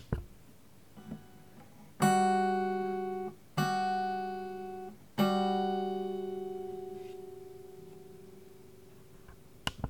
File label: intervals 7, guitar